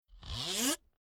Sliding Fingers Across Plastic Ribbed Pipe Rising Pitch Zip Cartoon; Tom Hutchings